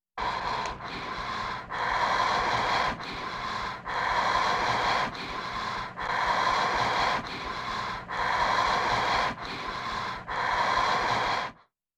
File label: Human Gasping